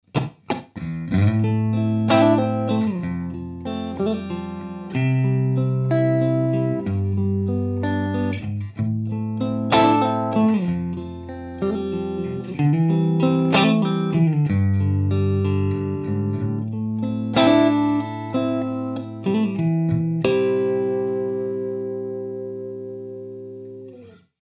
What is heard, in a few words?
strat marshall 100w